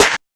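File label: hip hop kit snare; Dr 202 Kit 1; Percussion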